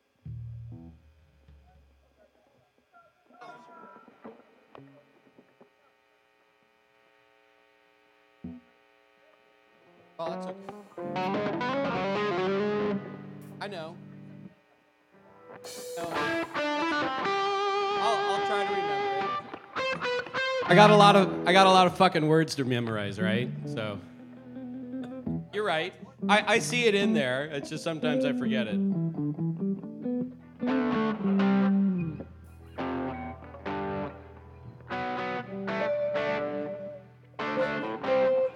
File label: vocals